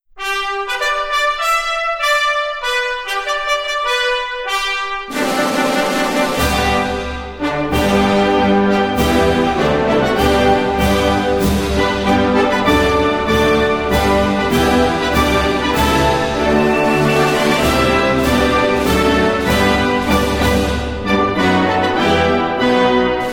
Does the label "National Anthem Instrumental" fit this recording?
Yes